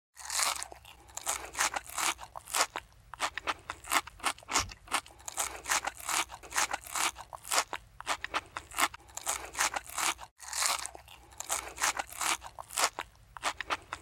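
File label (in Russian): откусывает и жует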